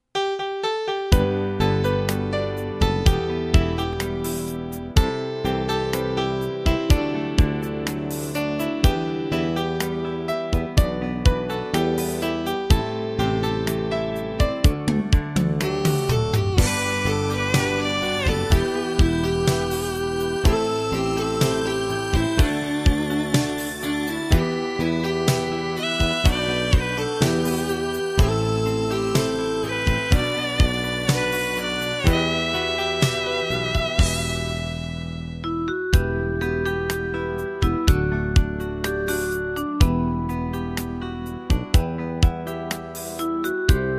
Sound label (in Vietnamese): (Không Lời); Giai điệu hòa tấu nhẹ nhàng, thanh khiết; nhạc chuông